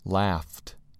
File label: laughed